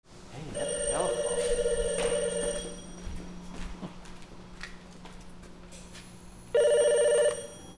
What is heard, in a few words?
PHONES